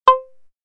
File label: Jaw, synth lead